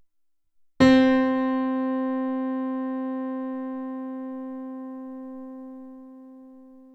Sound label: piano